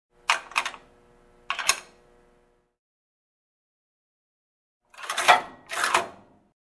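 zvuk brosaniya moneti v vendingovij avtomat avtomat dlya gazirovki, sound of dropping a coin into a vending machine, soda machine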